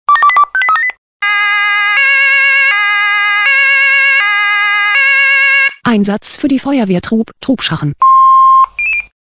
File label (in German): SMS Alarmierungstone; FW Trubschachen